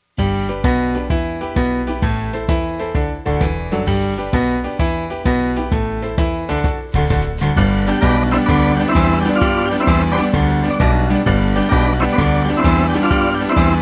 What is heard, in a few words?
Shuffle